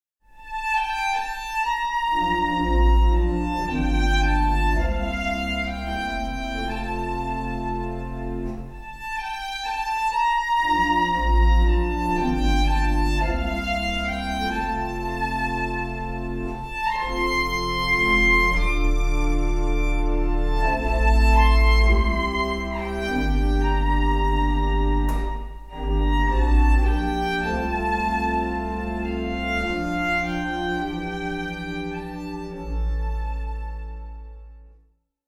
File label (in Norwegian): fiolin